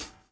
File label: DOWN, DOWN KIT 78